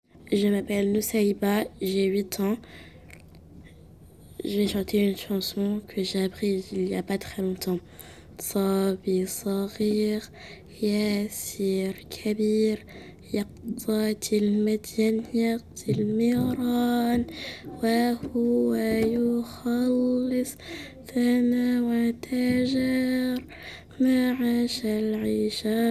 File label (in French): solo arabe